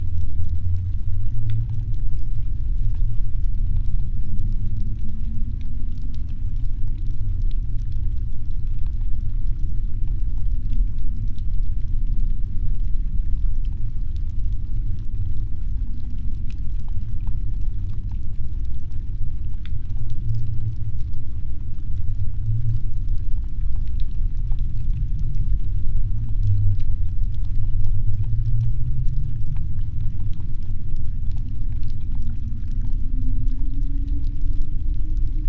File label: Ambient